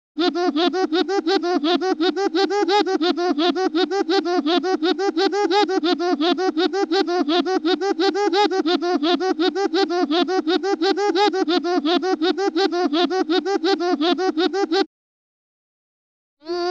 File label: Sci Fi Space Capsule Idle Sci Fi Space Capsule Start And Away; Underground Caves